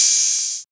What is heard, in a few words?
Ride, Cymbals; Vengeance Samples; VEH2